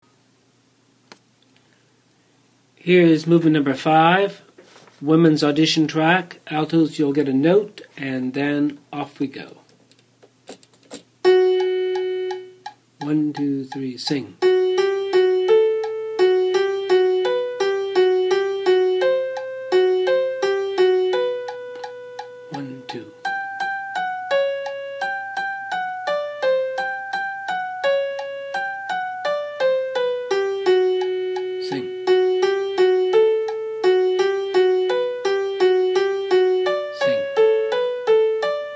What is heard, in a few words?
audition S A; britten; cantata academica